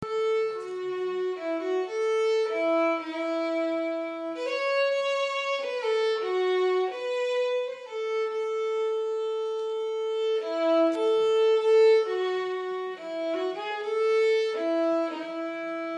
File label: Gaelic air